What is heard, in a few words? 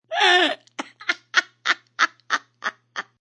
Lady Crazy Laugh, A female laughs; Laughter; Voice